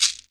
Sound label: shaker sound D key, Royality free shaker one shot tuned to the D note. Loudest frequency: 5226Hz